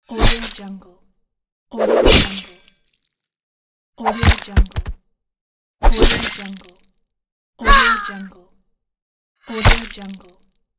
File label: blade attack